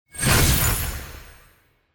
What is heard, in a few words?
open quick